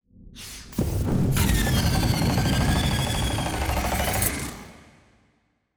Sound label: rift event flamethrower; astral planes; rifts